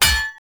Hammer Impact Parry; Weapon Impacts; Player; Combat

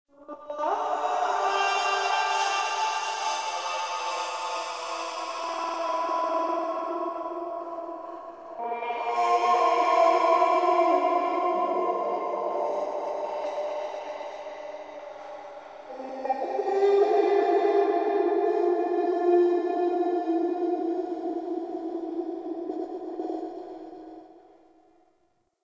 Energy Thread Music; Warframe; Apostasy

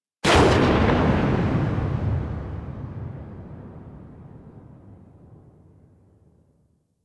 Gunblade Tail, Weapons

